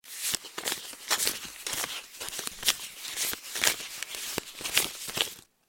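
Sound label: several file pages; zvukovie effekti neskolkih fajlovih stranic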